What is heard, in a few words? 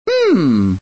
Hmm; Mario; Super Mario 63